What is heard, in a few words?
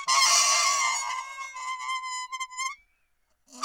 ronk, science fiction